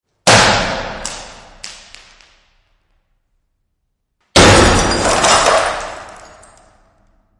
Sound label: breaking sound